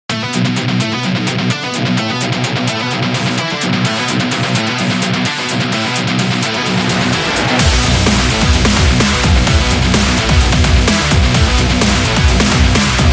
Fast

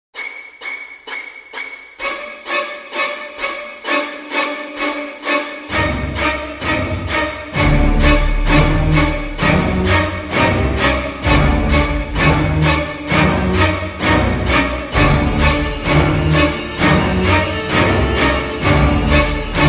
Horror Violin String

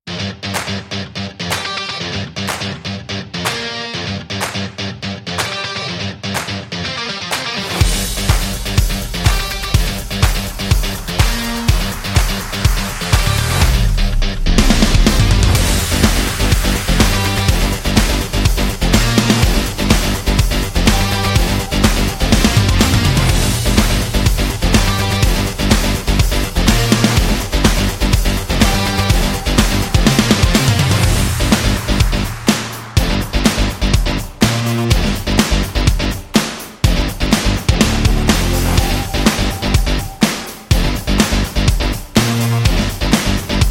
Dynamique Upbeat Rock; active rock music

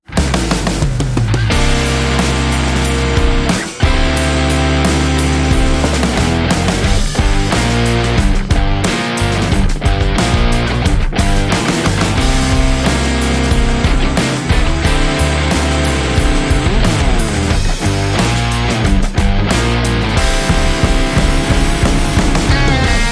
Rock Blues Starter; blues intros

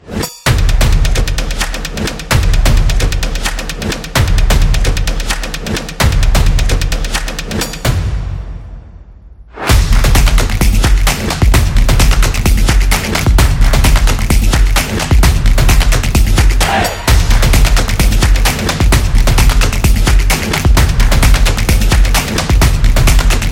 Percussion Intro

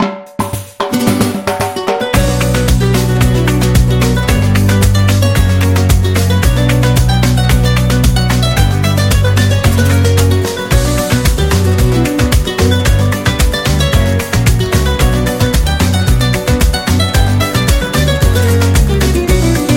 Flamenco

